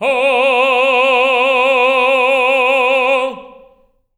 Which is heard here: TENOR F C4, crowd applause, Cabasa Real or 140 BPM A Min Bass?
TENOR F C4